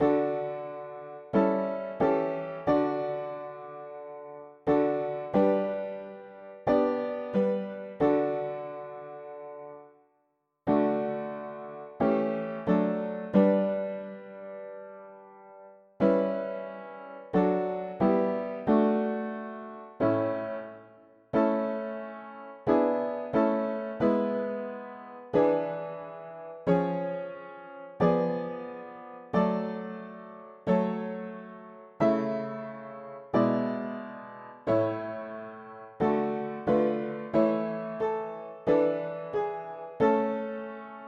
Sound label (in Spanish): mono piano; hymns; Himnario pequeno